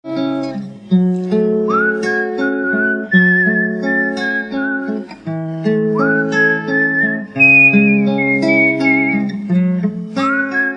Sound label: Ringtone Mobile